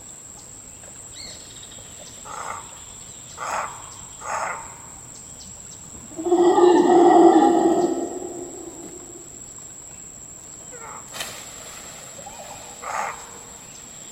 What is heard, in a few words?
howler monkeys echoed; soundscapes